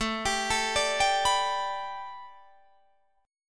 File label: A 9th Suspended 4th A, Guitar DADGAD; chord